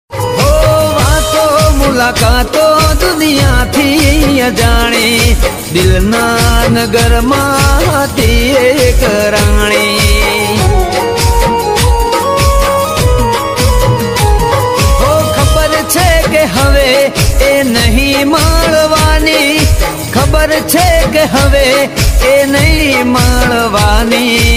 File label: gujarati ringtone